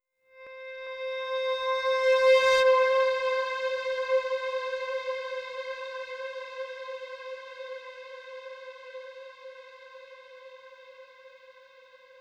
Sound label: Overlay Dark